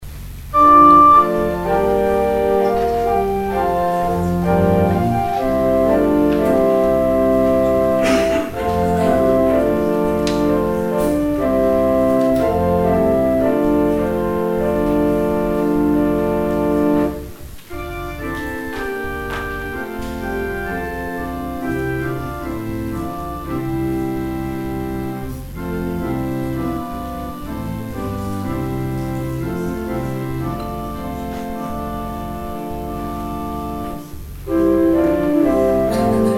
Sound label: organ solo; Organ interlude ('Offertoire' C.A. Chauvet); St. Mungo's